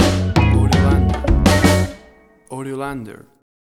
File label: Acid Funk Street Walking Stinger; A cool piece of Acid Street Walki